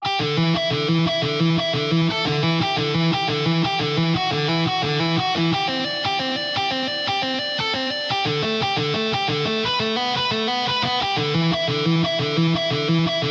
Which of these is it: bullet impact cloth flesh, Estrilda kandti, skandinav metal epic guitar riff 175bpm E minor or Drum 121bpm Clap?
skandinav metal epic guitar riff 175bpm E minor